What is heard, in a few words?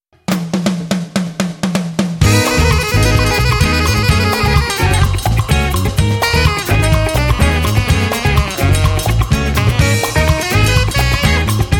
samba